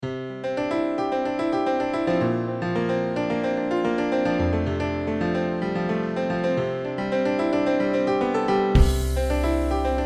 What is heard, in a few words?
piano loop